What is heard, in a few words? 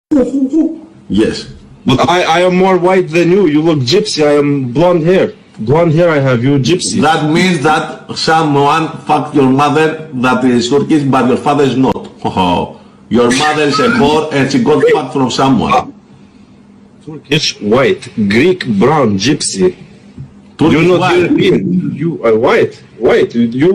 chant